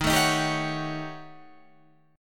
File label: D sharp 7th Sharp 9th Flat 5th, Guitar, Drop D